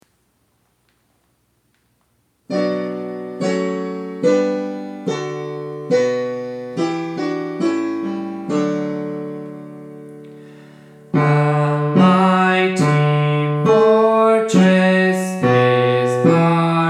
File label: Bass